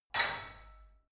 barbell only lift up reverberant hard, fitnessstudio; sport freizeit